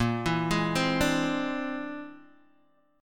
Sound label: A sharp 9th Flat 5th A sharp, Guitar, Open G